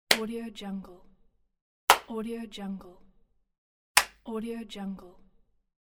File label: slap